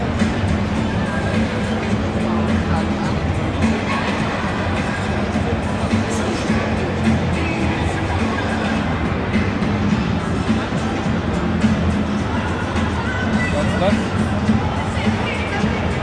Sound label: waldstadion live